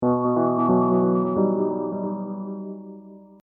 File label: Hip Hop Dark Rhodes Licks 90 Bpm Swing B; Keyboard Loops; Lynne Music